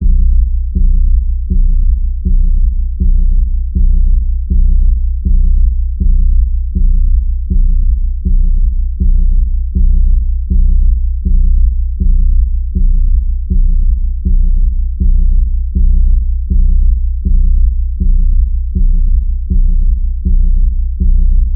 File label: Threatening Doom Pulse B, Key of B